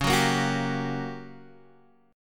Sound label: G Minor Major 7th Flat 5th Db